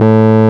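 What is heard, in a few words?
RMI EP piano A 1; Sonic Reality - Vintage Timetraveler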